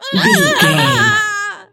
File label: voice female death